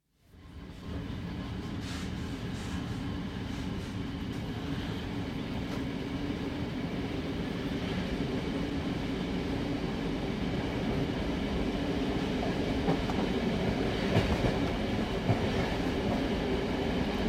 Long-distance train, internal, starts, constant run, stops; Budapest to Pecs; Hungary; BBC